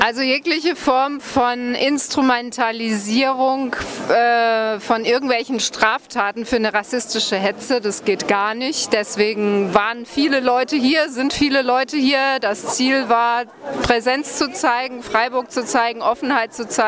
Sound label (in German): instrumental